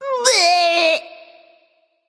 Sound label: tongue; smite; voicepack; kukulkan